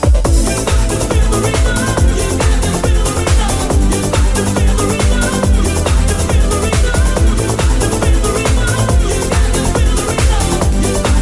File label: beat pressure rhythm